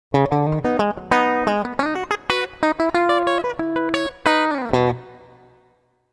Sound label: style tele lick